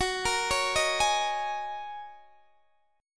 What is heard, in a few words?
Gb 6th Add 9th Gb down Guitar DADGAD